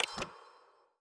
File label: continue Button Click; netent